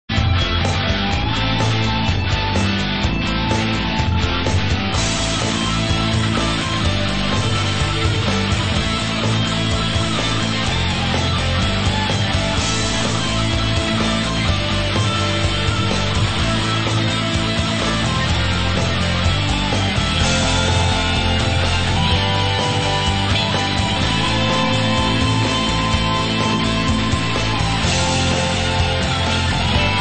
Guitar Plus Remake

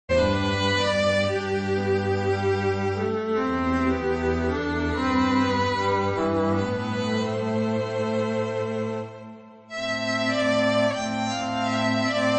Trio

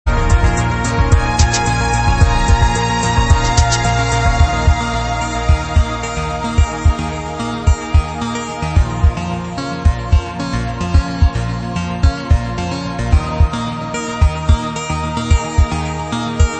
Synthwave Remix; International Karate Part I

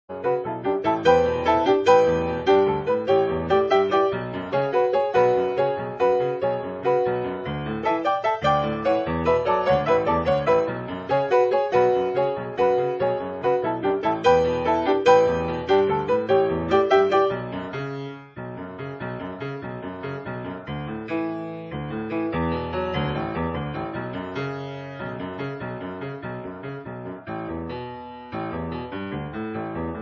medley (grand piano); Defender of the Crown

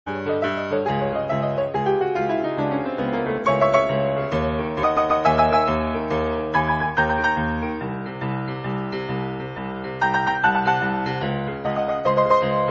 grand piano; Mission A.D. gameplay theme

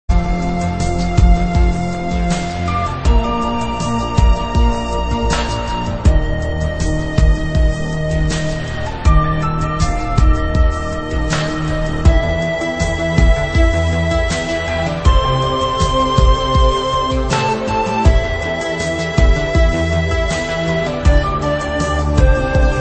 Ambient Relaxing

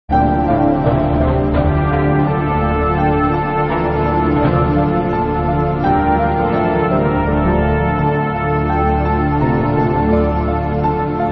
Orchestral; Gordian Tomb

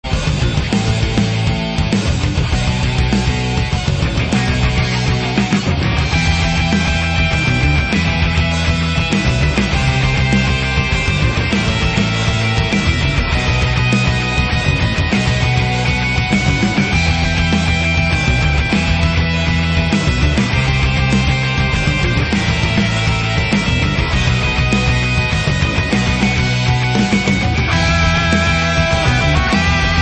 Modern Metal Mix; Ocean Loader

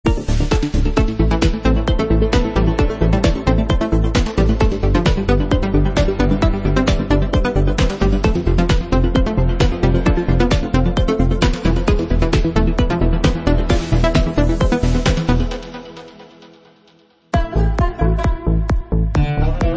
Turkish Native Instruments; Giana Sisters

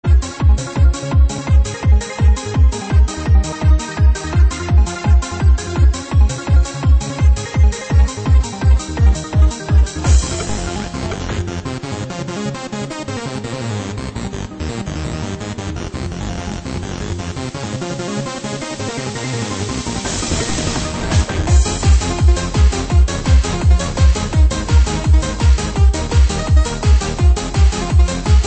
Plastic Pop

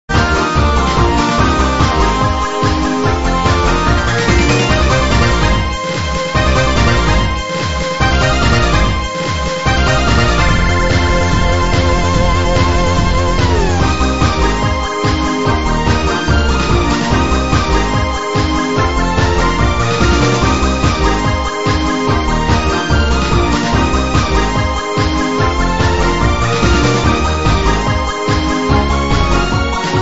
Laser Dance; Deflektor

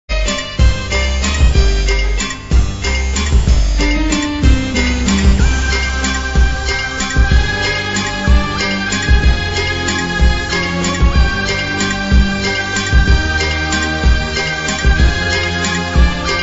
medieval cover; Master of Magic

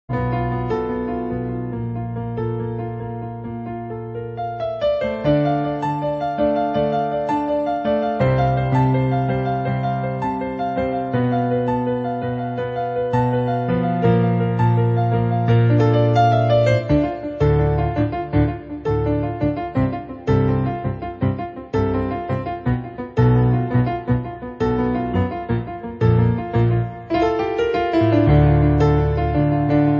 piano; Erebus

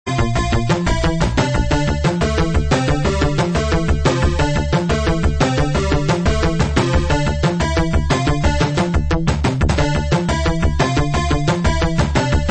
Adlib version; Test Drive II

